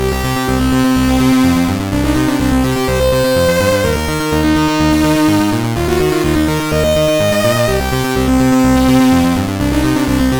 stereo feeling; InStereo 2.0

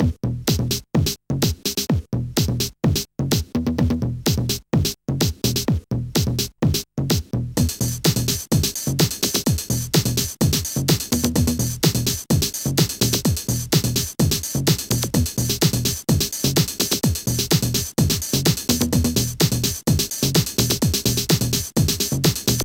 sfx loading music; SoundFX 1.3

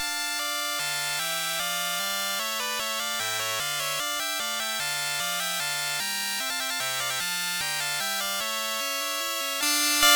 Menuet; Composer

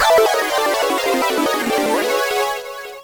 jingle; Super Loopz

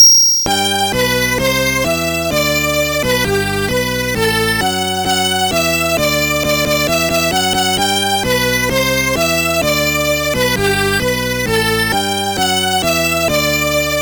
All Things, Music Box

